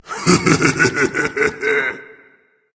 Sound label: laugh berserk; cuchulainn